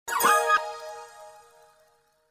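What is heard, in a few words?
pass Notification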